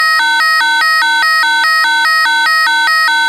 science fiction incoming missile alarm

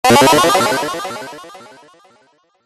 multimedia game sound positive action tone; zapsplat

